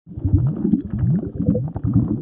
underwater; openffa

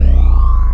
spawn; lmctf

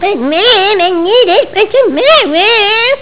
whine; lmctf

